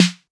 rich lows steel snare drum sample f key

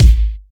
loud bass drum single hit A key; kick drum samples

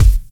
thick steel kick drum sample F sharp key

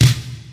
full snare b key

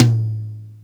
high tom drum sound a key; Bitdepth 24

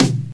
acoustic tom one shot d key

